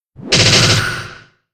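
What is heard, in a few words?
giant cheshire attack; monster; Senillinea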